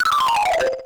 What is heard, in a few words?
boo; SNES; SMK